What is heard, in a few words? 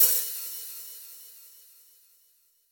small reverb cymbal sound g sharp key